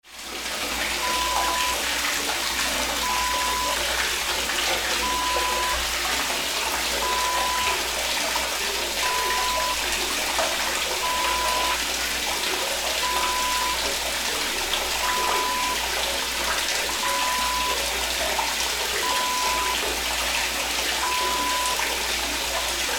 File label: bathtub water run